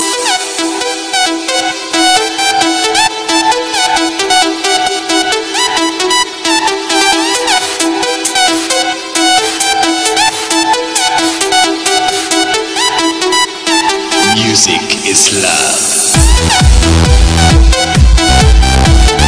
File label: House; Xperia